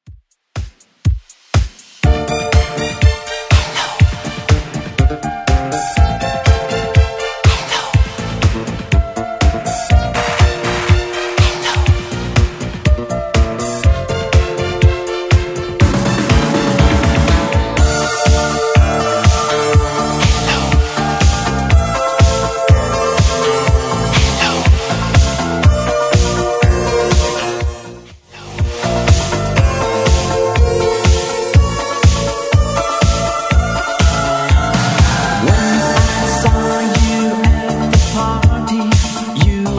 80s Redrum 122bpm; Maxi Mix

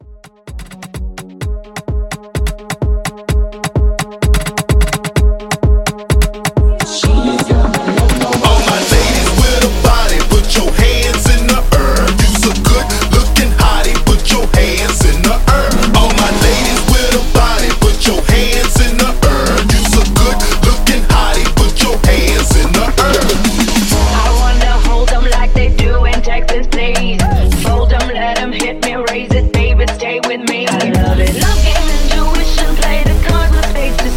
Afro Hype Dembow, 128

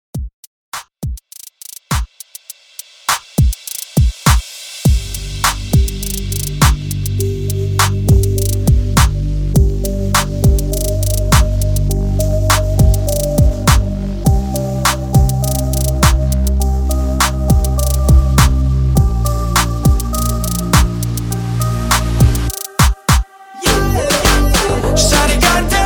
REDRUM 102 BPM

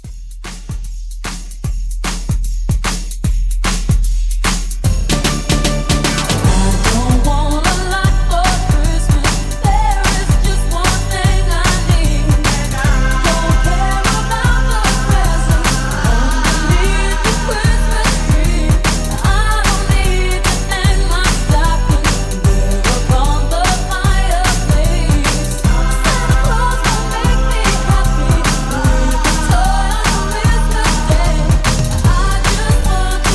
Quick Re Drum 150

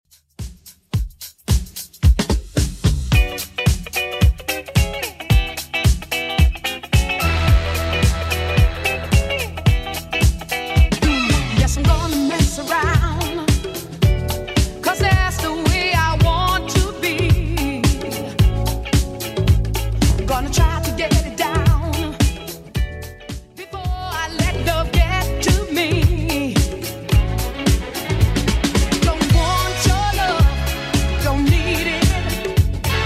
80s Redrum 110bpm

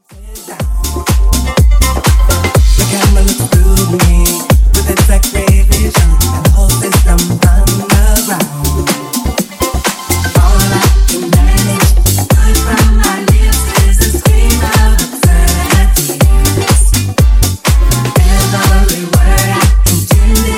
Disko Funk Remix 123Bpm

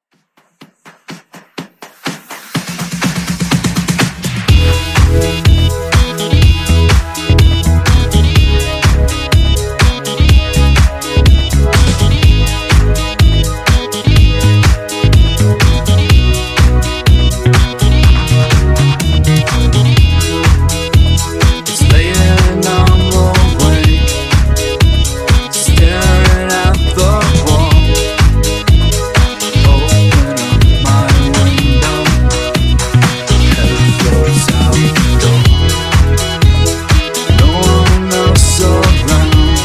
Funk Vocal 124 Bpm

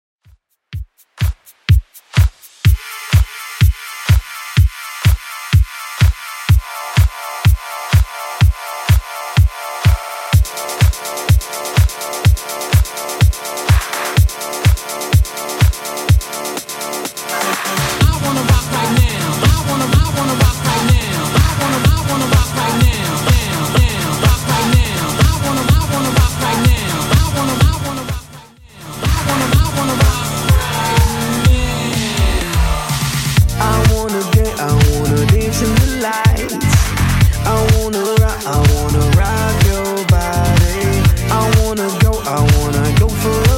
2ks Redrum 125bpm

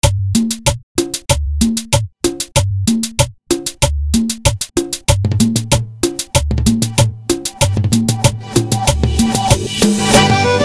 Cumbia

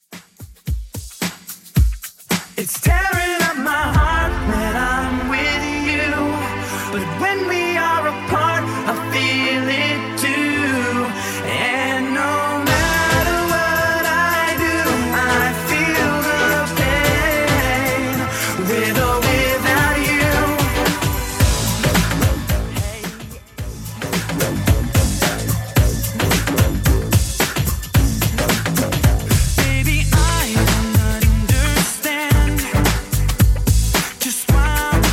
90s Redrum 110bpm

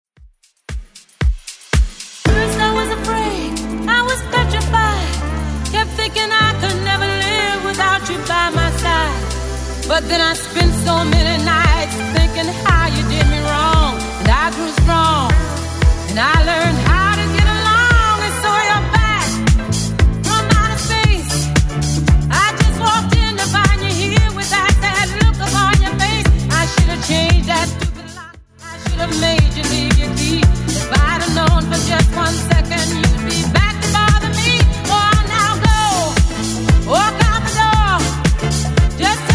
70s Dance, 115bpm; Redrum

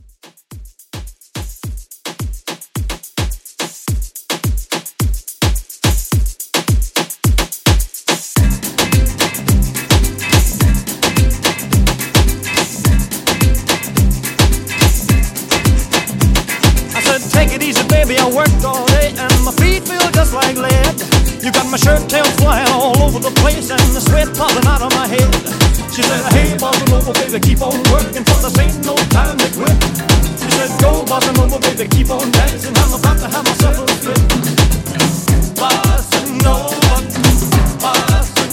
60s Moombah Re Drum

